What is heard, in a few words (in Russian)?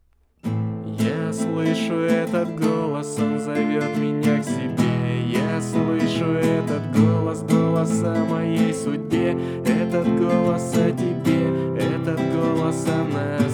Golos